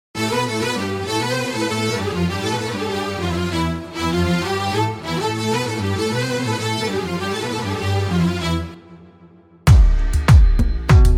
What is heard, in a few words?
Oriental String Ensembles; Gabi Masso; Oriental String Ensembles 7